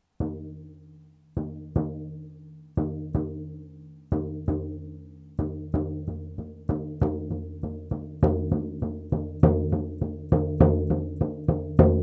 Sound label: Reindeer Loves Gift, drum